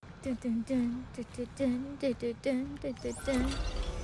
dundundun

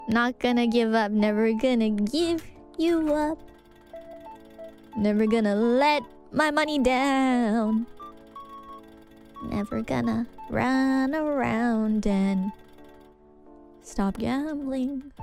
Singing; IRySinging

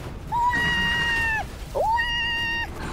baby cries

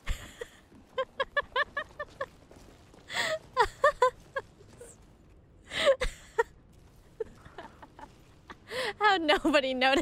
laugh

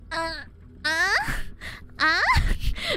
noises

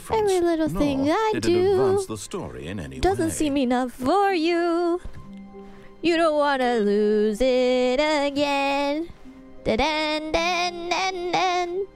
Singing; IRySinging

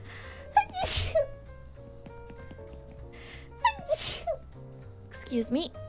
Sneeze

